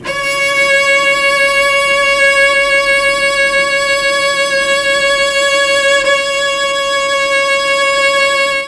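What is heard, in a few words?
C#4 Chamberlin cello; Mike Pinder - Mellotron Archive CD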